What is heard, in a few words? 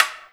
STICK, Percussion; InVision Interactive